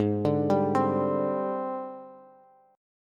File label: Ab Major 7th Flat 5th Ab, down, Bass; arpeggiated; JGuitar